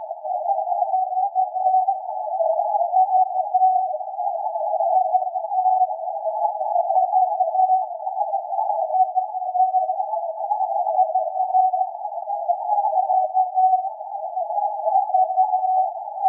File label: CW 750Hz random noise; DiversityStereo; NR